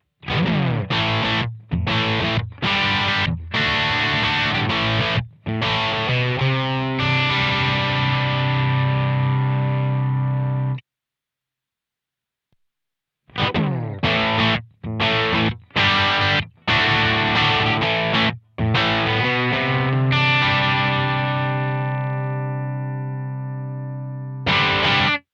Amp USA Twin Gain on 3; Simlpifier; Amp AB

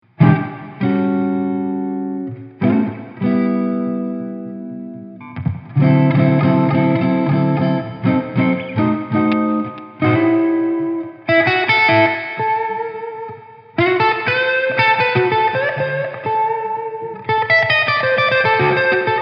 Dirt

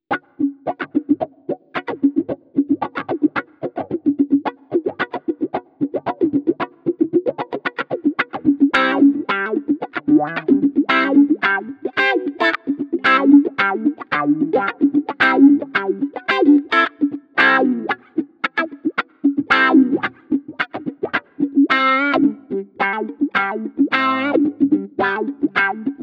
Cry Baby Clean; Zakk Wylde

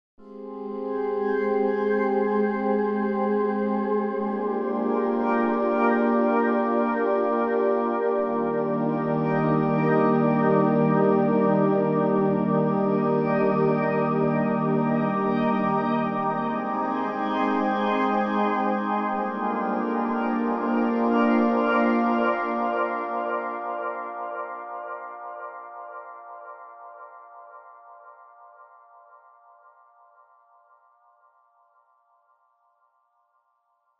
Korg SDD 3000 Synth Pad Tape Echo; UAD

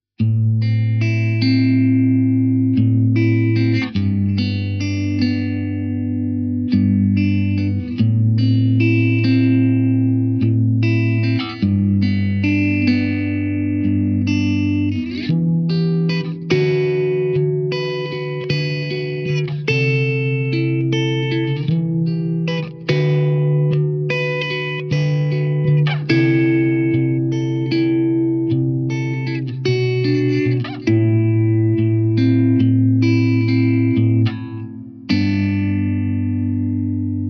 Vermona DSR 3 Guitar Picking

